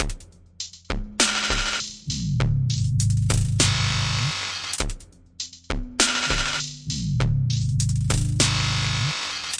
glitch hop serenade, DRUM LOOPS and BREAKS, 050 bpm; 000 to 080 bpm